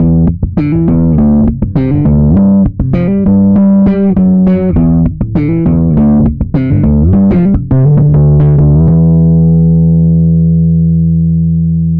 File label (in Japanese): OD スラップ